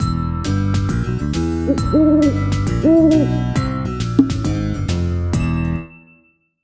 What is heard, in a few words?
Owl; Halloween 2019